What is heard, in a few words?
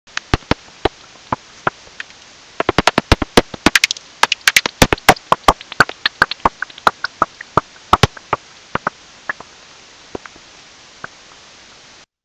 Common Pipistrelle, bat